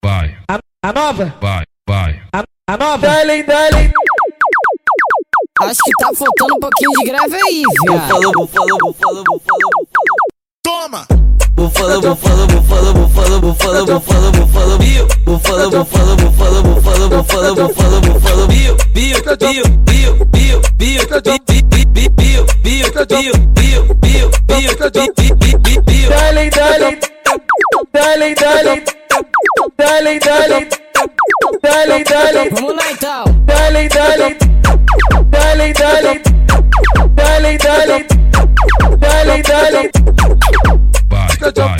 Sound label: 130 bpm funk light; bufalo bill; serie gold